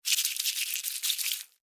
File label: Insect Move Wet, Insect, Movement, Wet, Bug